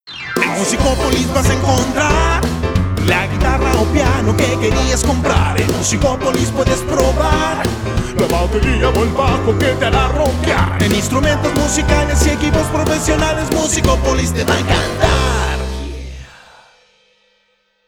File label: JINGLES